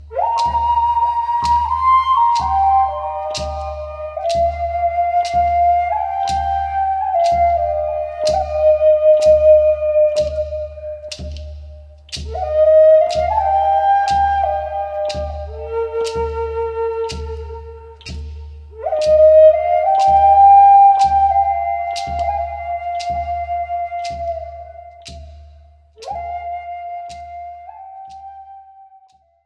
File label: Zuni Lullaby, indian flute